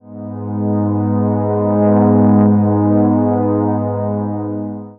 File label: warm analog pad chord in gm